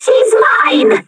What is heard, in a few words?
player hurt, metropolice; npc